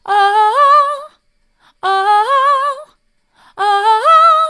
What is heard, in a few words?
oh oh oh mid, vocal